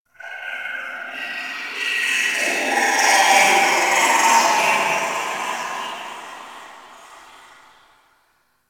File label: Ice Distant Robotics; Warframe; Corpus Ice Planet